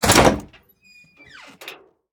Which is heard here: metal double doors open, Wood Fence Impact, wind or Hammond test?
metal double doors open